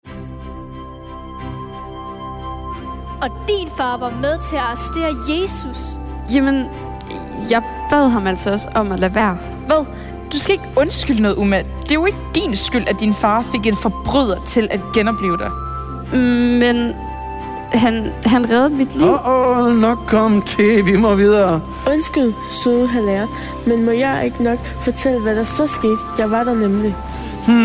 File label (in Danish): Drama musik og drama